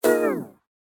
UI, lady, vocalizations, crunchy, multipitched, multitimbral, back; Eiravaein Works; Start Select